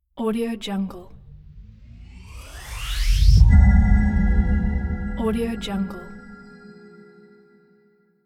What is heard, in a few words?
cinematic tone; arashdarehshouri